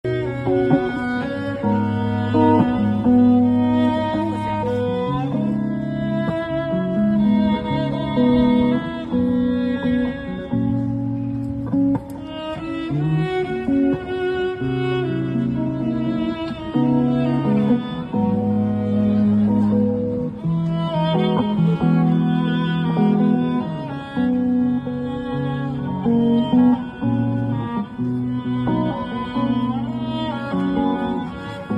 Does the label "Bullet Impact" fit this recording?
No